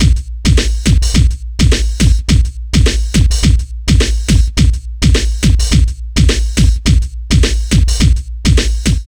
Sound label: 105, Breaks; loops; 105 BPM; Loopmasters; Jon Carter & Alex Blanco; Block Party Essentials